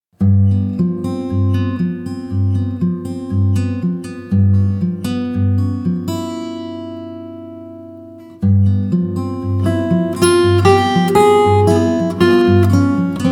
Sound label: oriental